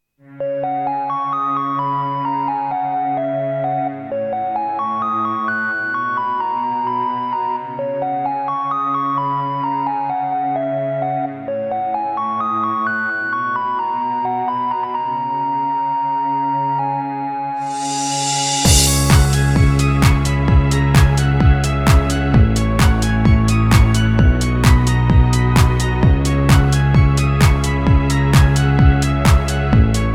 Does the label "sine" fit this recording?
Yes